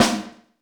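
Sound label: snare, 60s kits; 90 sSampleCDs; Crypton - DrumBase5000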